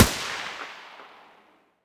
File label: muzzle M249 mono distant